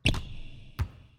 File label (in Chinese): 摩擦; nba; 战中